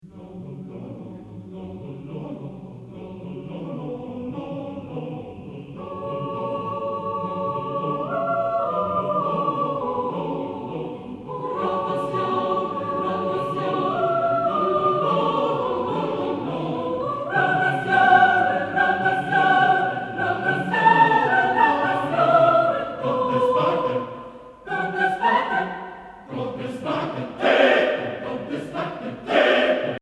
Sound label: rondo vocal